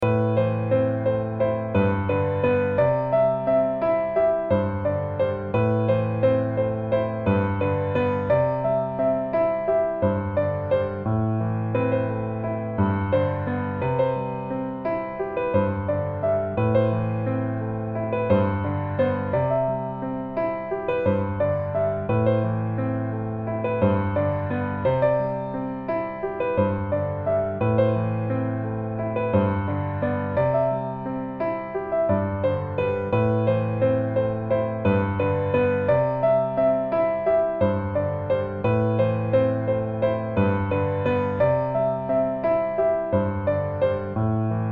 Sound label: Piano